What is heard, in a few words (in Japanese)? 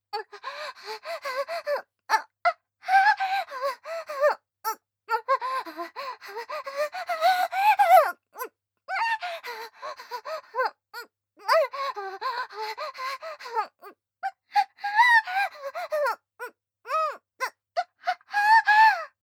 喘ぎ（強め）; 【甘々媚びアクメ】性欲旺盛な陸上部の先輩後輩JK、ベロチュー青春溺愛えっちでコーチの汚ち◯ぽ生ハメ特訓♪